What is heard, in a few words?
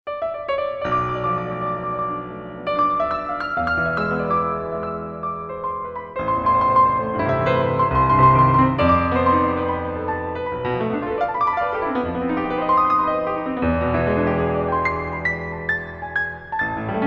piano; pa2xpro; triton extreme